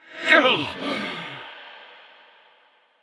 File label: Wound Crit; King Terenas